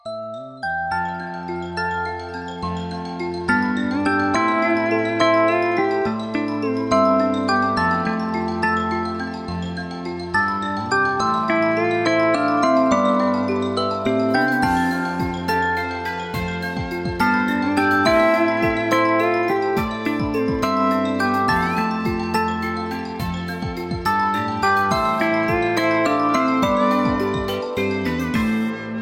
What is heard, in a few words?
Sweet Ringtone Bells